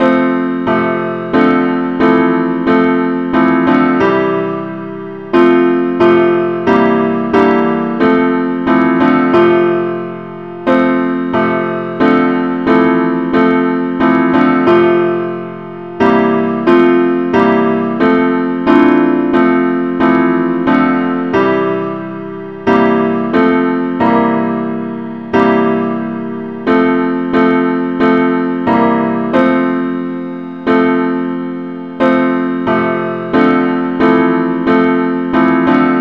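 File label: advance accompaniment key F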